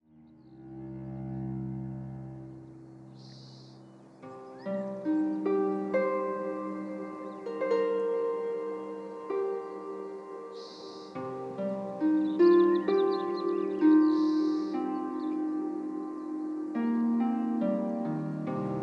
Reiki Harmony

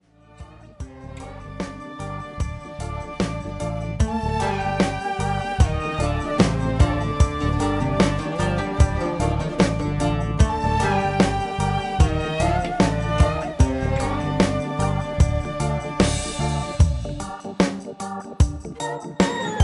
Reggae Sharqi

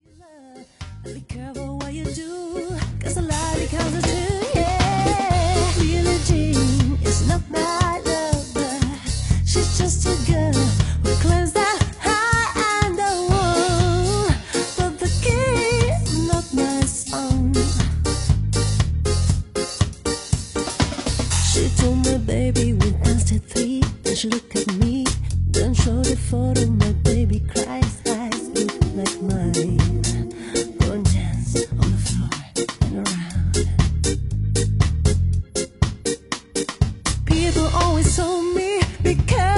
Ska Reggae Version

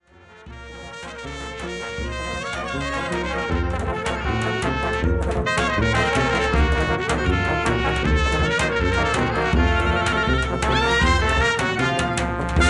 Balkan Brass Band Underground cocek

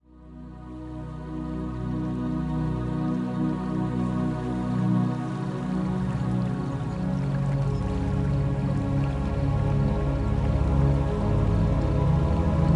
Relaxing Music

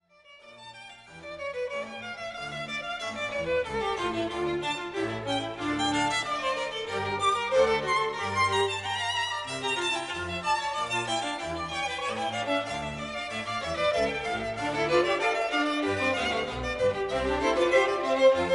Concerto for 2 Violins in D Minor, I. Vivace; BWV 1043

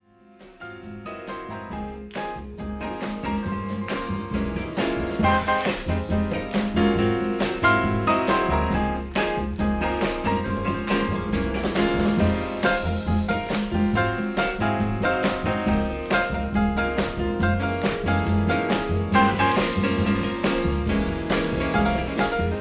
Jazz

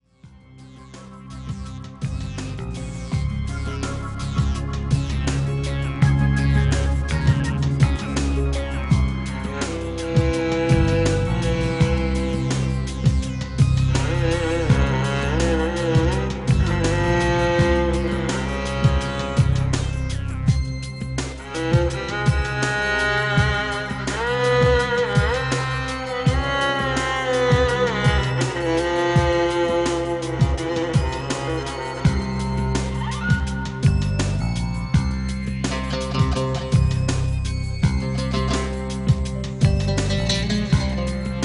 Relaxing chill out music Arabic

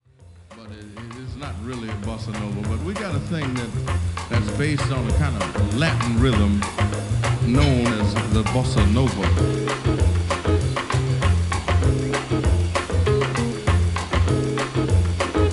Latin Rhythm

